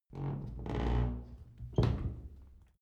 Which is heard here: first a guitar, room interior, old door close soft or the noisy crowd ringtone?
old door close soft